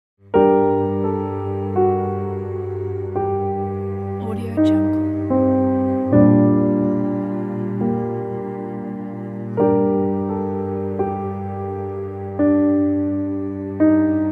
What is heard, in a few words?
mystical logo